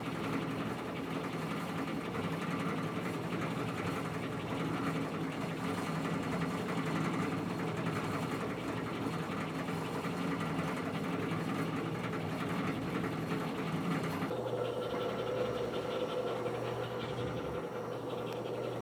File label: Side port swish